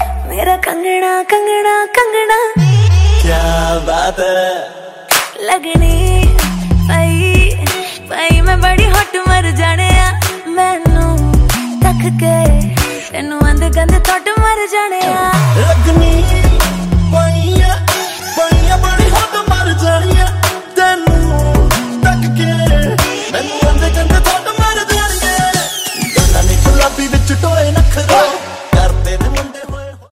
punjabi song

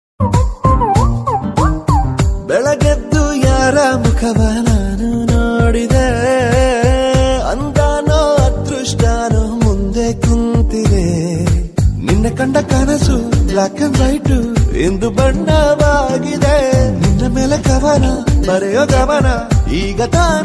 Kannada song Tamil

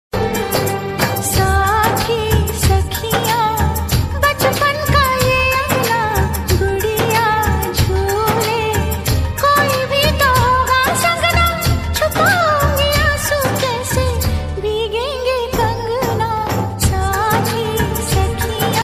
wedding song

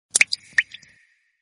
Water Drop

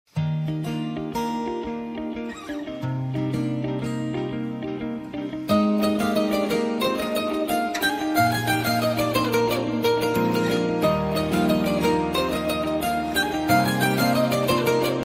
Instrumental

